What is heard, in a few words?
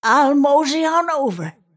move pain; snapfire